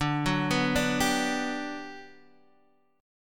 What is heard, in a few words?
G Major 7th D